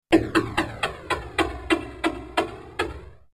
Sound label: lotto wheel, NÖJESFÄLT LOTTERIHJUL